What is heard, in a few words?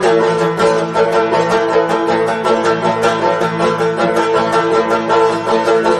banjo; music